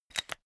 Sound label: umbrella telescopic retract fast